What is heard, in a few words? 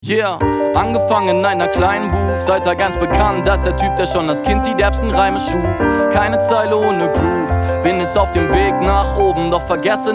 Piano